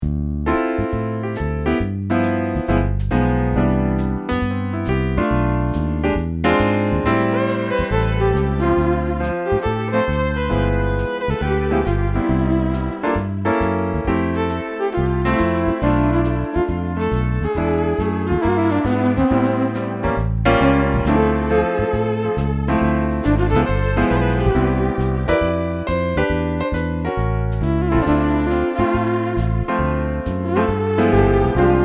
Keys with drum patch and Violin; Cocktail and Dinner Music